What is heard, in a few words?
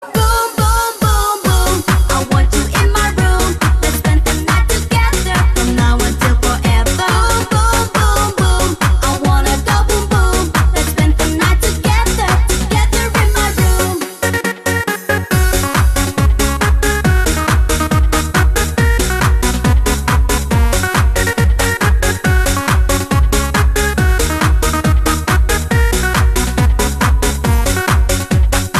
boom boom boom